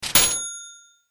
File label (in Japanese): レジ